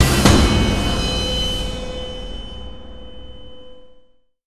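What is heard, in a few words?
sting, hit cymbal bow; transformers war for cybertron gamerip; ACTIVATE TRYP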